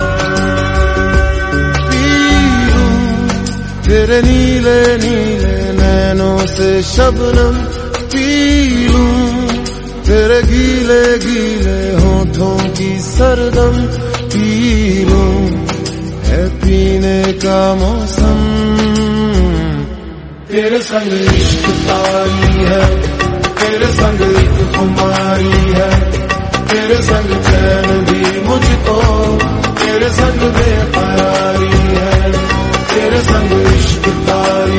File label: Slow Reverb